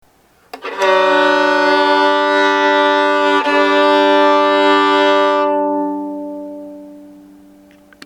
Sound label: G-D doublestop, violin; tuning